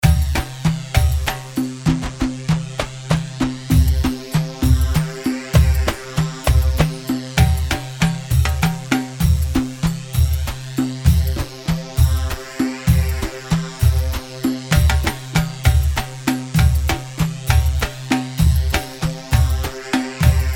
Sameri 3/4 98, khaleeji

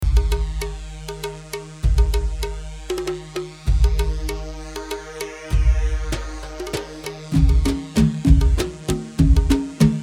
Aarda 3/4 98, khaleeji

